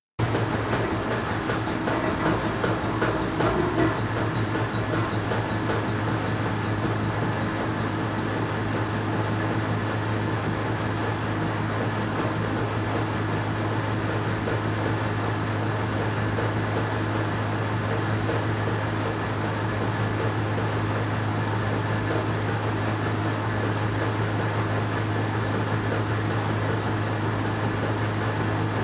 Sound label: Washing machine washer spinning distant perspective; appliances